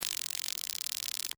Extermination, Bug, Zapper, Kill, Insect; Animals:Insects; Sound Effects:Animals:Insects; Blastwave

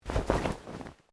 Clothing Shirt Cotton Move

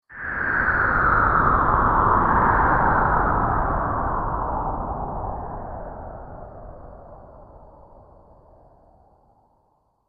Astral Winds, Science Fiction Sound Effects; Astral Winds 06

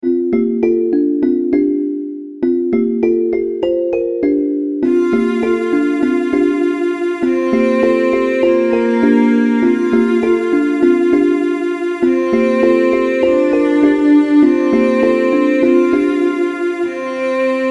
Orchestral Music Loop, string loops; Theme - Orchestral Music Loop; Royalty Free String Loops

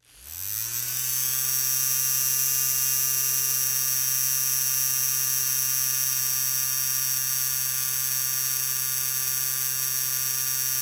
Fan Small Handheld Run Long Steady; Electronics

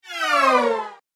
Cinematic Whoosh Flyby

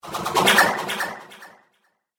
Cartoon Magic Spell

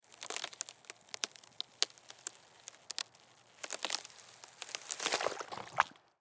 Ice Pond Frozen Crack Large Piece Fall In Water; Weather; Ice Sound Effects; Blastwave

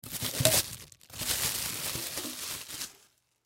Popcorn Tin, Popcorn Tin Plastic Wrapper Remove; Food; Foley; Household; Blastwave

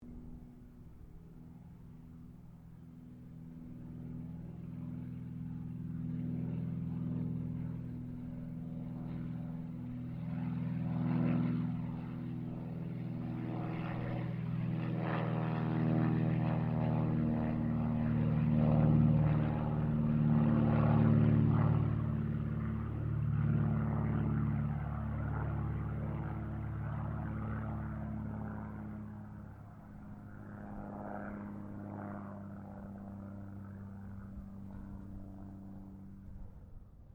AIRCRAFT CESSNA O-1 BIRDDOG PASS

